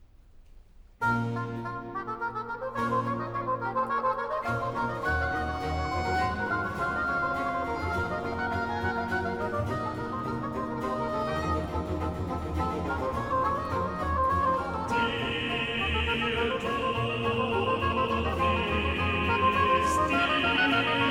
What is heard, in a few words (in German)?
Choral (Tenor); BWV 36 'Schwingt freudig euch empor'; Part 2, VI. Choral (Tenor) 'Der du bist dem Vater; Bach; Köln; 1st Sun in Advent; Cantata